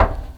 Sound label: step metal, footsteps